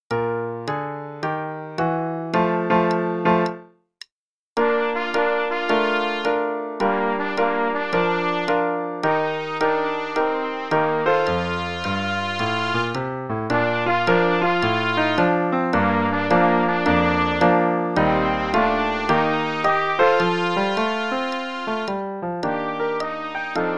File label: Play-a-long, both parts, qn=108, trumpet